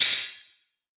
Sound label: high mage shot